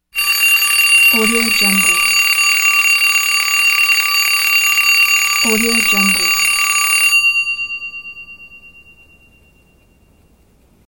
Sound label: alarm clock